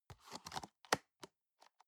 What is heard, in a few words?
plastic box food small close soft